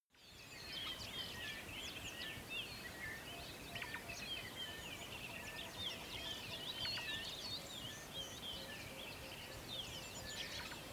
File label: water hole early morning